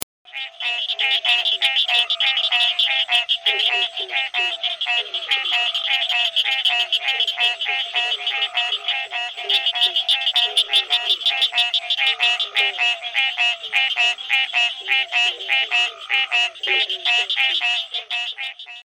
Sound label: green tree frog